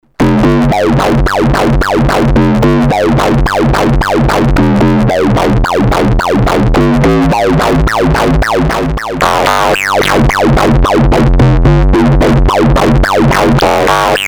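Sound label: arc, Novation Bass Station II monophonic synthesizer: 2 analog oscillators; aggressive; analog monophonic machine with subtractive synthesis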